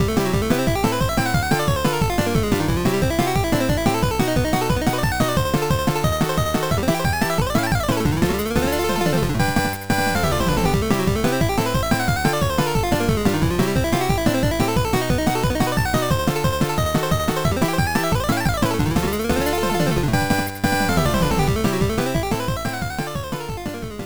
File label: VS Victory; bomberman 93; turbografx 16; pc engine